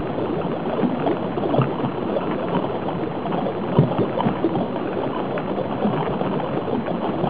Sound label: sewer